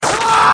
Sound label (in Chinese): 衣服炸开